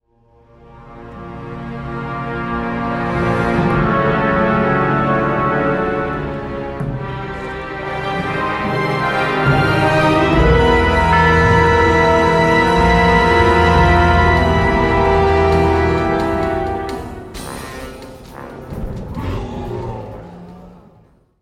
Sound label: Epic Crescendo